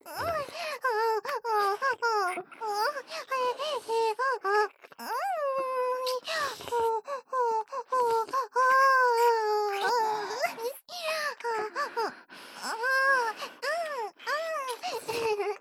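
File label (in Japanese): 演技喘ぎ＋ディープキス; 美冬; 小春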